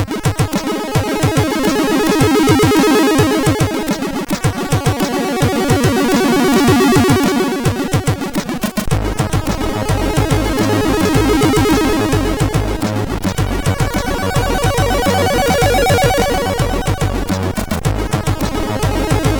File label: Little Beat; MOS6581R4; hvsc